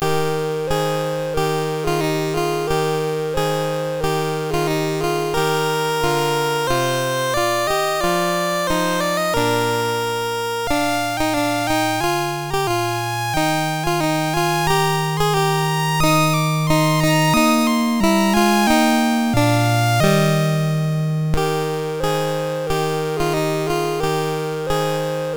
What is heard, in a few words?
Christmas Music, MOS6581R3